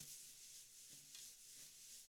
TAMA; ILIO; Double Platinum Drums 1

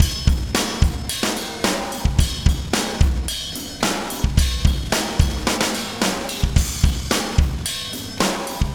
Beat, Processed Drumkits

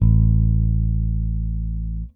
Whole Notes A, Bass; Bass X

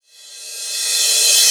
Reverse Cymbal

